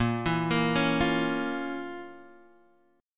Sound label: F Major 7th Suspended 4th Sharp 5th Bb